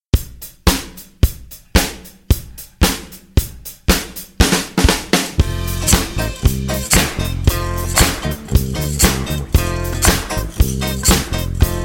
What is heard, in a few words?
zydeco